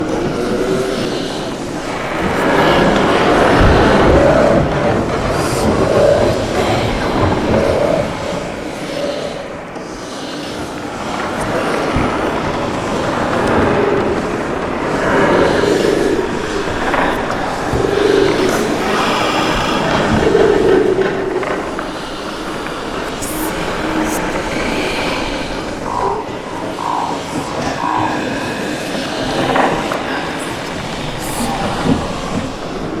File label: magic tree moans loop